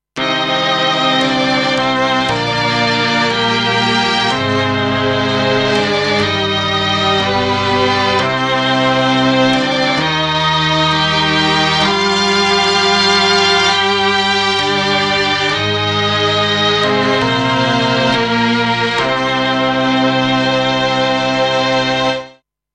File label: Mixed Strings; Mello Sample